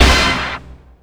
STAB

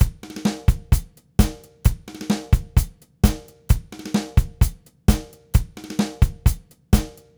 Tango, Latin Percussion, Drumset; Sonic Foundry (Sony Creative Software); Joe Vitale Latin Percussion

